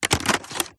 Printer Toner Cartridge Open Close Pull out Insert Eject; MKH8060